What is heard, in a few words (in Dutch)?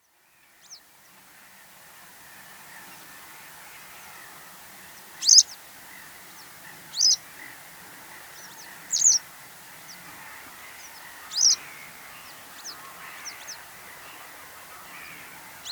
witkeelkwikstaart roep